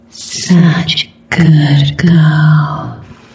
music stinger; such a good girl killsound

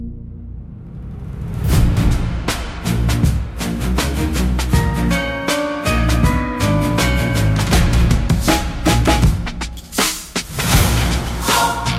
music match intro 160bpm; archmother alternate music

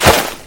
hook impact success; bebop; criminality soundpack

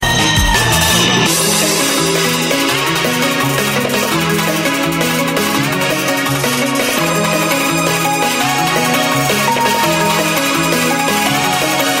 music idol timer team 160bpm; Omori sound replacements

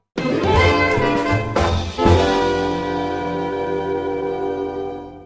music stinger player respawn; Family Guy respawn

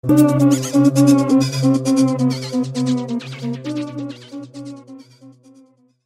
music stinger; omori sound replacements; mid boss arrived

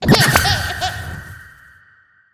magician animal curse transform; kitty0706 sinclair sounds

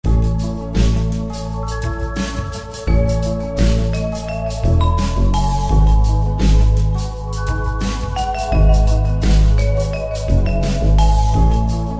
ui pause lp; welcome to the green room; deltarune

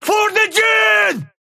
mirage desperation power, screams bloody murder; mirage screams bloody murder when he is low health

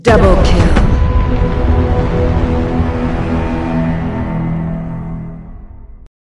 music stinger; killstreak music; UT2K4

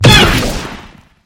wall jump; wall jump trick

